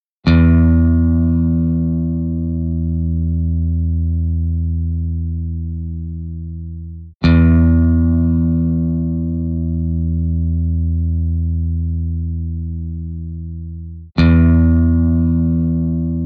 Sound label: E String low